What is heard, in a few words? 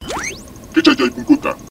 gate droid